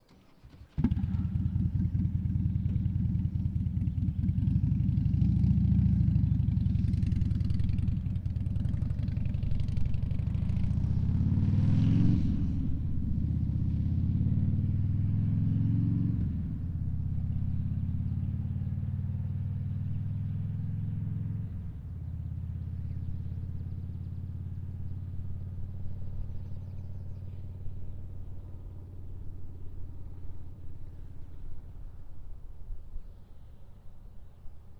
Harley Davidson Night Train - EXT - RAMPS - Start Away By Up Stop - Distant; 2004